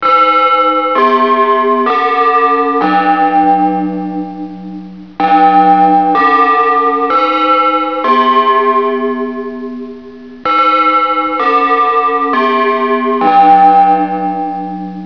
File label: noon chim